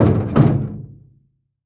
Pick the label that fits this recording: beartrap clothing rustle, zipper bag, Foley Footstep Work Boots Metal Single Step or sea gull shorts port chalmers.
Foley Footstep Work Boots Metal Single Step